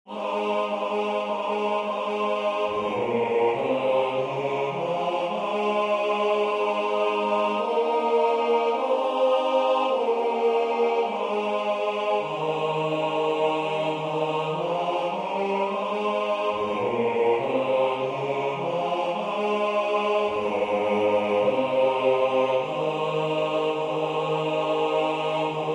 lead kindly light B; newman

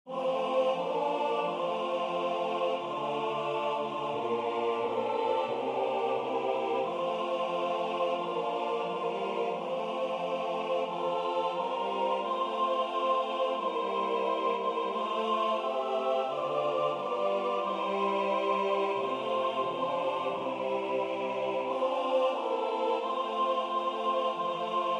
SATB; Let us break bread together

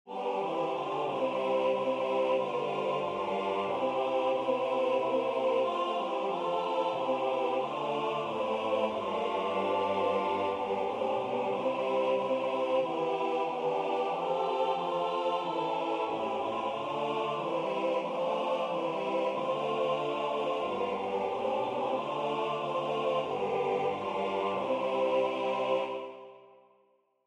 SATB; hooglied; al spreek ik met der engelentalen

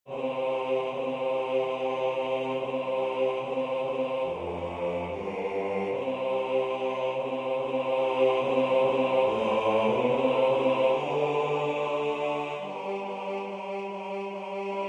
The Lord bless you bas

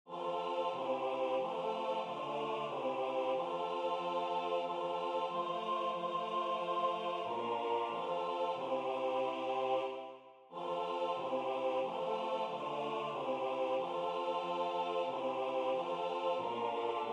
ave maria SATB; arcadelt

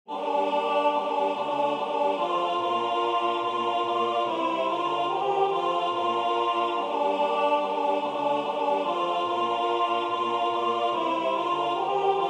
pavane A; arbeau; belle qui tiens ma vie

